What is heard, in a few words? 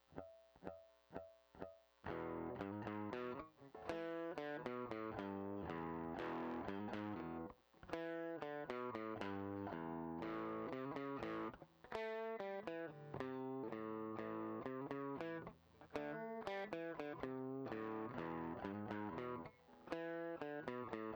riff in e a